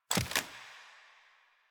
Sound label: Steps